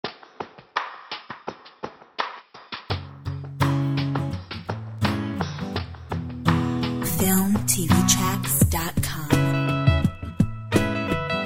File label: Acoustic Groove